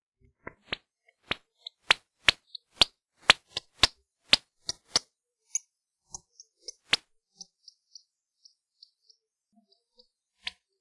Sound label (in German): kleiner abendsegler, fledermausrufe; fledermäuse rufe